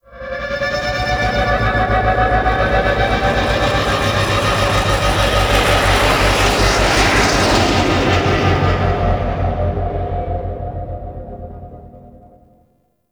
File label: Level Undocking; Vehicles; Flight; Warframe; Liset; Nora Liset